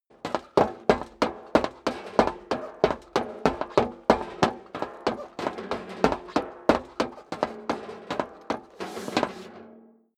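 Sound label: step boots metal rattle run sequence, footsteps; schritte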